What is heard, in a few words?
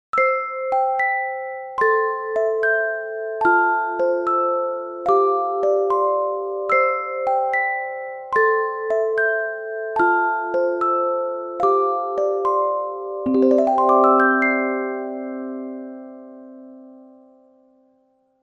chime